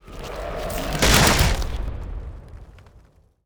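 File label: Telekinesis Break Planks; Lucius III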